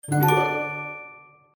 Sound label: notification